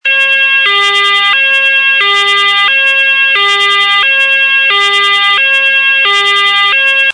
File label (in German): polizei martinshorn